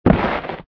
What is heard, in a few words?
small explosion